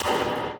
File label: Impact; Phasmophobia